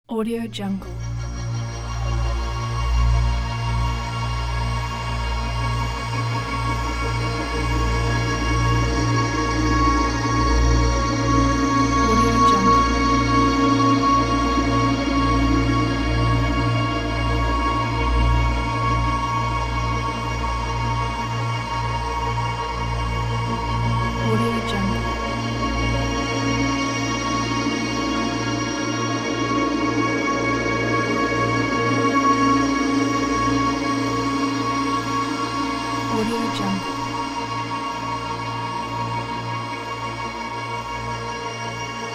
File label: deep space intro